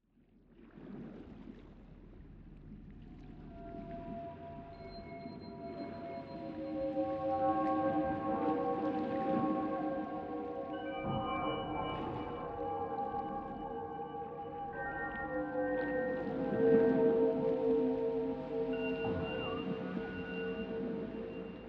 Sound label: music